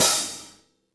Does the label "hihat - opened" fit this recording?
Yes